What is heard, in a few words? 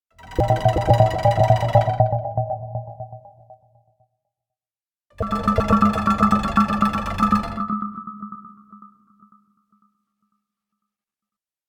Space Phone Transitions